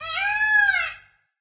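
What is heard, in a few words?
peacock Tap; peackok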